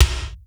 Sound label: GATE K; Crypton - DrumBase5000; R70 KIT #2